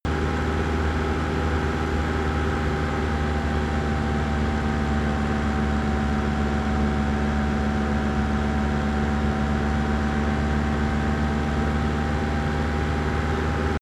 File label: van engine steady 2000 rpm